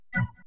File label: Menu Back R